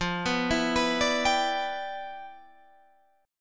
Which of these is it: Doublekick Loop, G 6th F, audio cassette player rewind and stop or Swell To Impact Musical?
G 6th F